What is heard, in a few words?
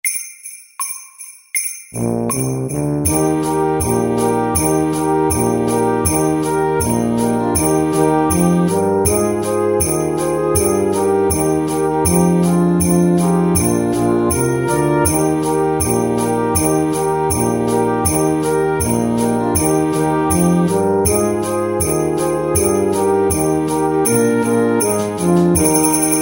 Jingle bells duet MEDIUM 80